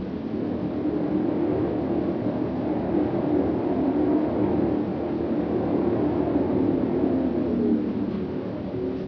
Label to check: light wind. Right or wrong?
Right